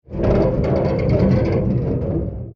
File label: damage vehicle; World Of Warships